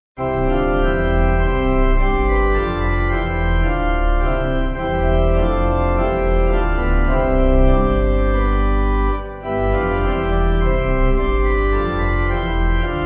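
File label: Pipe Organ, Music Backing Files for Churches; Offertorium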